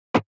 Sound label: Percussion; Unit Rcrds